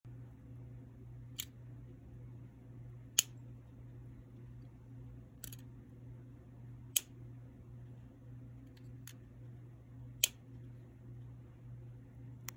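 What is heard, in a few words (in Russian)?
открытие и закрытие корпуса Apple AirPod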